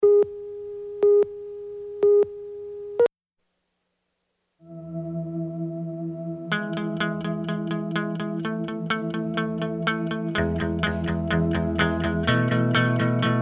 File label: Instrumental